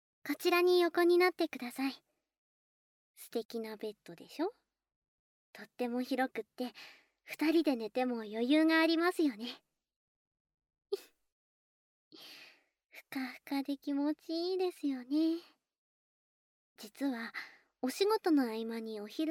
猫舌でザラっと耳フェラ

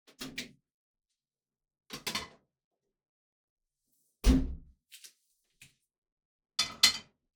フライパン出す; 3DIO; 食事に関する音

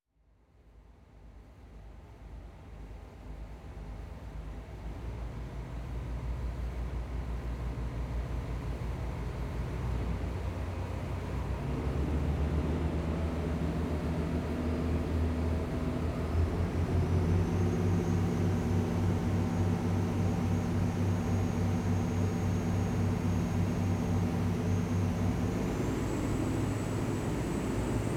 猫島行きフェリー環境音; 音集め猫集め計画

